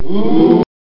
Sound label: ooh